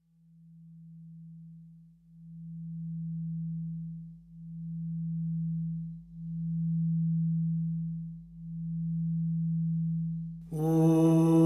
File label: overtonal singing; Yoga and sound